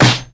fat acoustic snare sample b key, snare drum tuned to the B note; snare drum samples